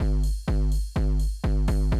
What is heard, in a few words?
125, Classic Drum Loops